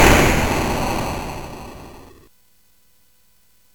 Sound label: sid CSG8580R5, GAMES; Artillery Duel